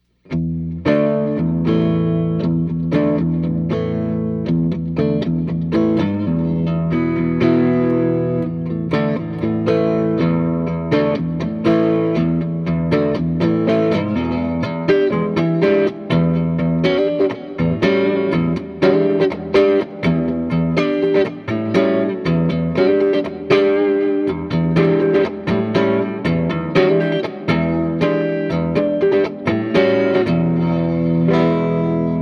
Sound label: strat rhythm chording pedal on Overdrive